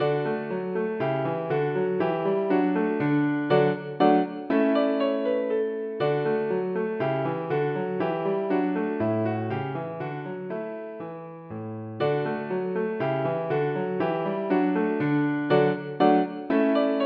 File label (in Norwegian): Menuet for piano